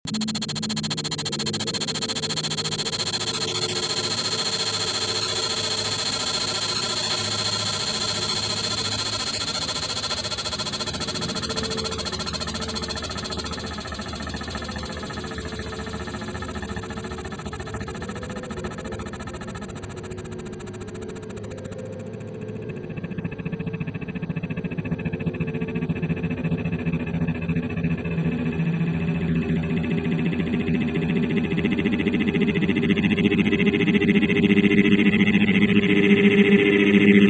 micro bowl; microacoustic music

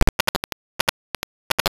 buffer fuct loops

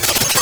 hits; microsound

